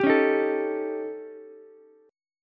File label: D sharp 7th Flat 5th, D sharp, down, Ukulele; strummed; JGuitar